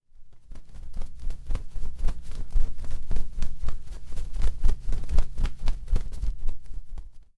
Aleteo Ave

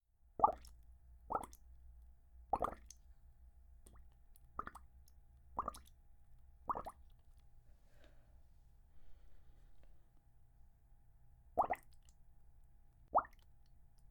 Burbujas

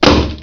Disparo

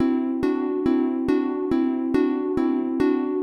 Pianos Y Teclados

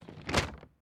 Abriendo El Avion De Papel

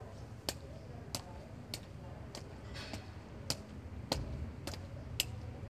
Pasos

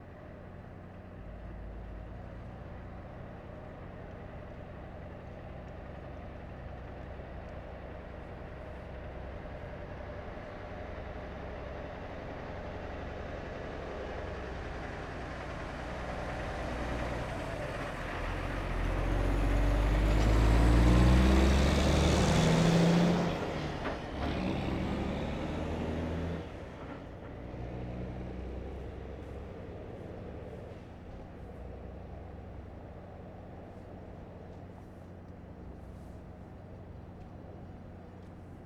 Camion

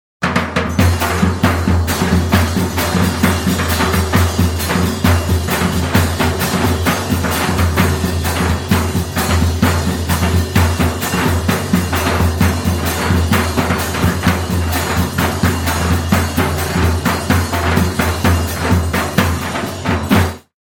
Cumbia Rapida

